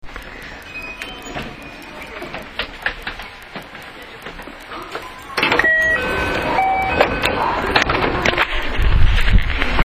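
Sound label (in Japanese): ドア開け, 223系6000番台, クリック音のようなものがするのは仕様; 223系の中で、モーター比率をいじった車両に付加される番台区分。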